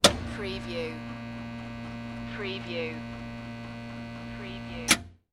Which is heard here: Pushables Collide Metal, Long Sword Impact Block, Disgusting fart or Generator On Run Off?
Generator On Run Off